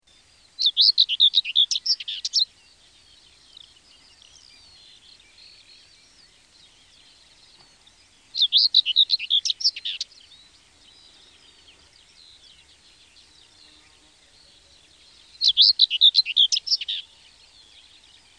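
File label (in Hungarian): Mezei poszáta; Madarak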